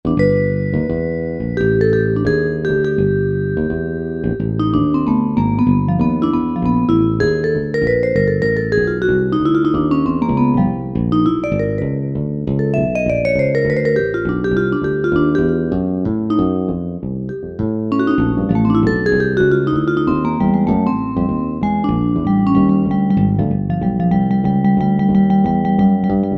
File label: Vibes Split